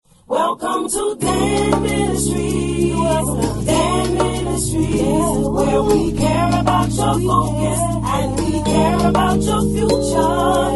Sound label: jingle; dan ministries